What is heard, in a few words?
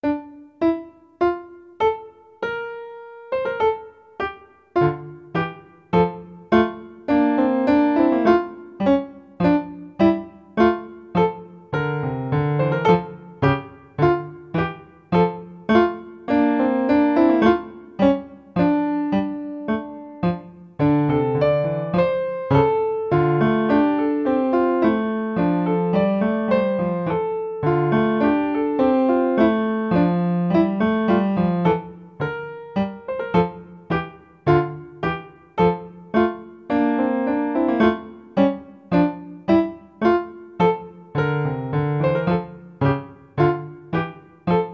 Canon in D minor, piano